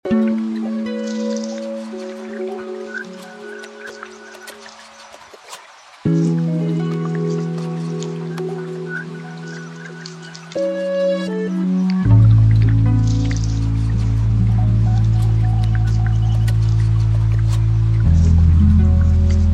160bpm A major

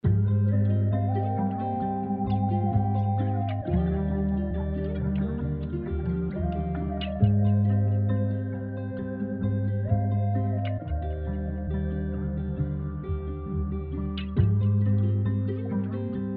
SOS 134 C minor pop

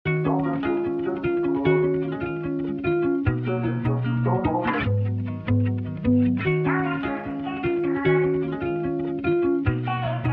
150bpm F major

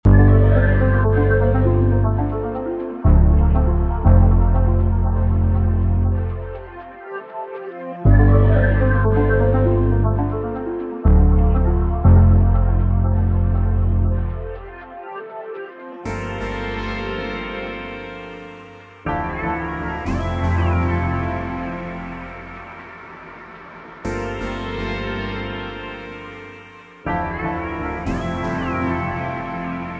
Zwitscher 120bpm F major

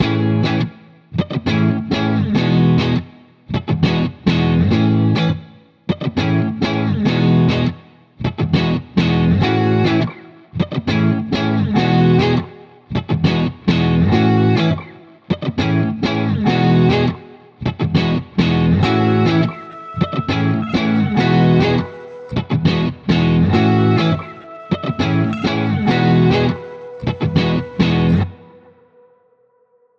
102bpm E maj

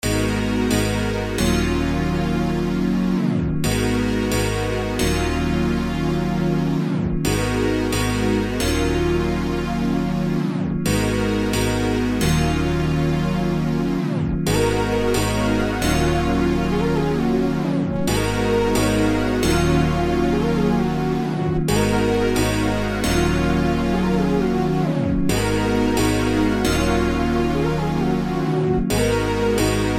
133bpm D min

